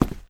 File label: boots concrete